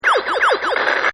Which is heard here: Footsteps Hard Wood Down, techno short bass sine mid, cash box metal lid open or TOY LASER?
TOY LASER